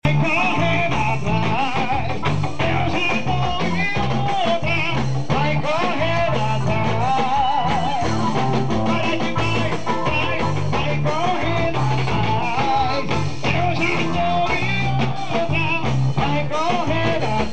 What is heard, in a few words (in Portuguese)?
forro ao vivo; jardim manguinhos